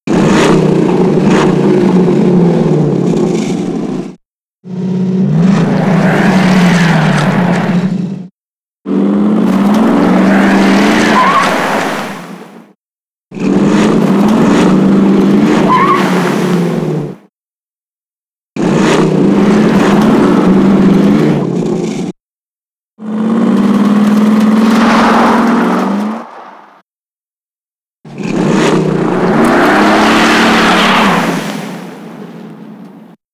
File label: Jeep Hurricane SFX